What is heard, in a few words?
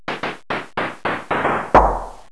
Elec Snare Noise, drumtraks